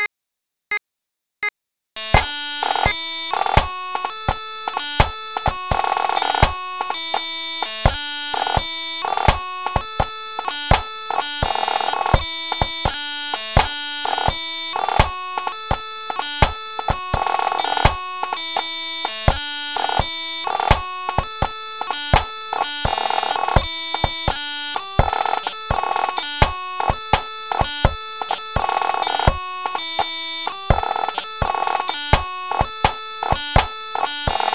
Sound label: Pipes and Drums 84bpm